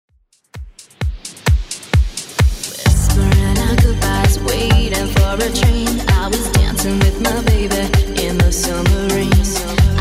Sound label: 130 bpm